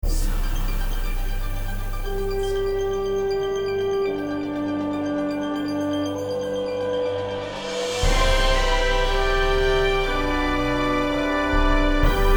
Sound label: Cinematic Stabs